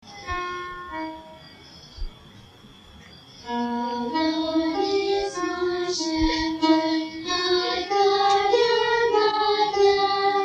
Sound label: Junior Choir Lord is my shepherd